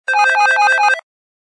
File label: Basic Electro Ring; Samsung SGH-i740